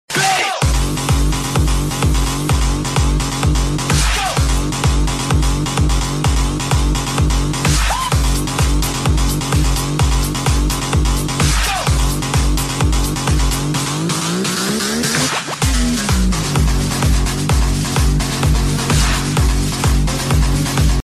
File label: remix ringtone